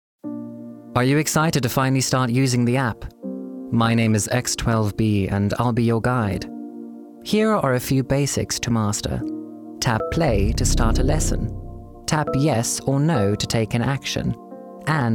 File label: Animation game Music